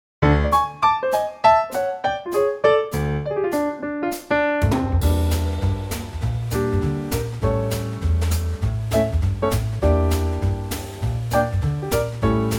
F trio

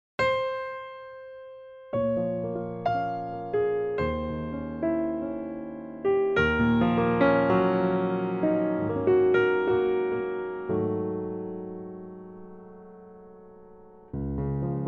Db piano; key - Db; Pianotrak; Beautiful piano only arrangement

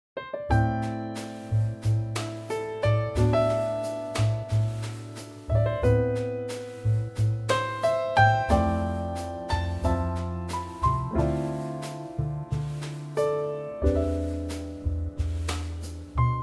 bossa Am trio

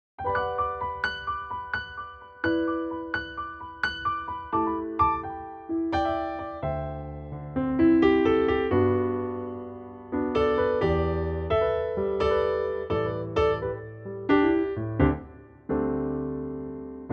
G piano; key - G - vocal range - E to B; 3/4 time; piano only

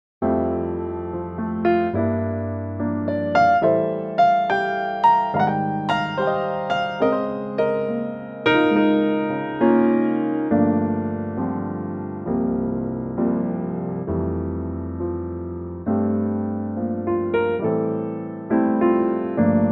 F piano; key - F - vocal range - A to D; Wonderful piano only arrangement

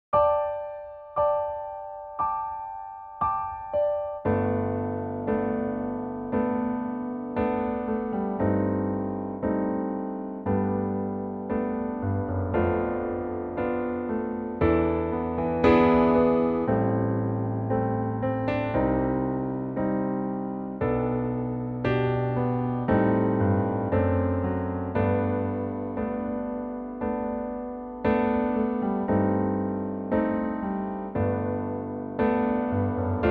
piano trak G; key - G; vocal range - F# to B; Lovely and intimate 2024 piano arrangement (using the Garritan CFX plugin)

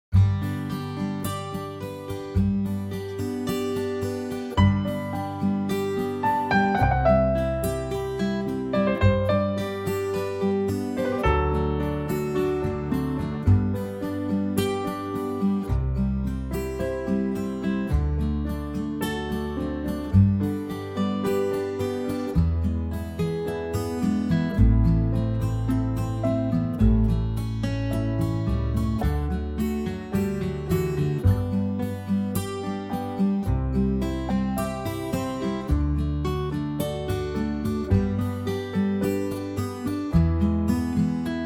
acoustic G

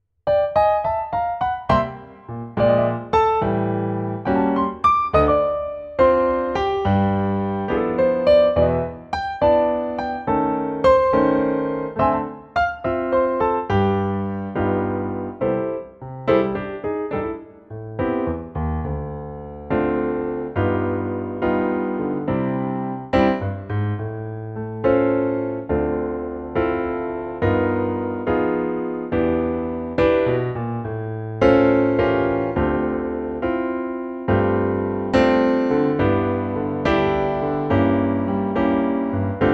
C CFX piano

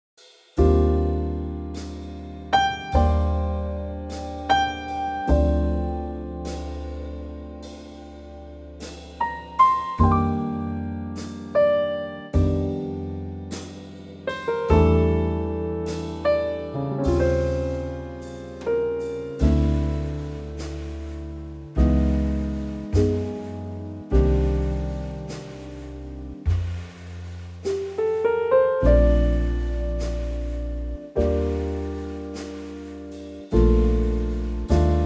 trio Bb

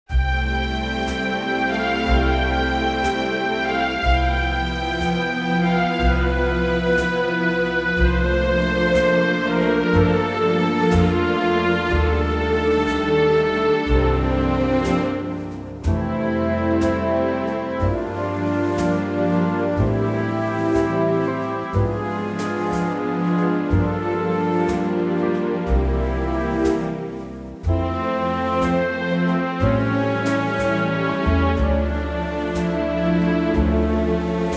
F orchestral; key - F - vocal range - F to A

